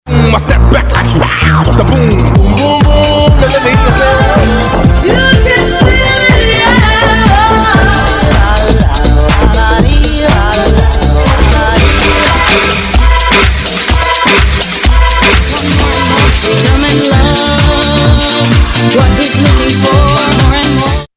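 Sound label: 90s dance classics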